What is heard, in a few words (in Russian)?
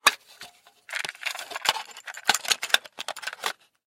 zvuk futlyara kassety